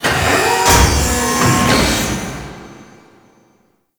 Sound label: Outpost Machine Start; Warframe; Corpus